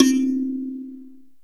SANSA C 4, KALIMBAS; Spectrasonics; Heart of Africa

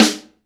SD BONUS, Drums

